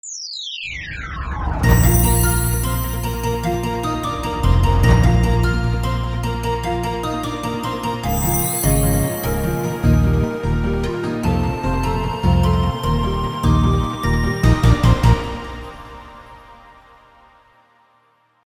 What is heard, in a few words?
News Ident